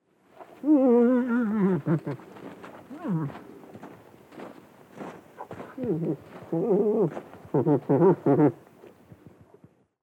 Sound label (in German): Elch Erregungsrufe; Hirsche; les voix des cervides